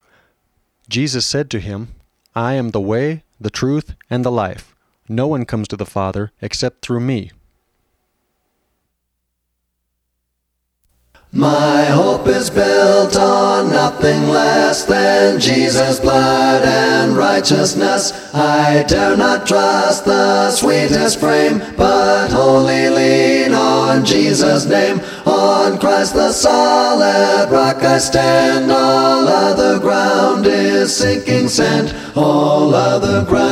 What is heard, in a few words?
music english